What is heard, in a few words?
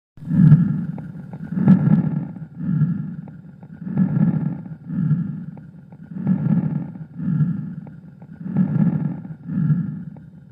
Crackes fine; simulatorvzorky